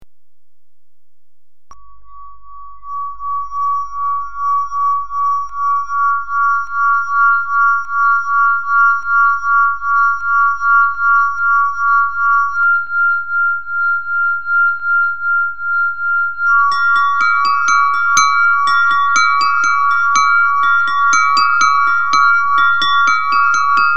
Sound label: Vinemelody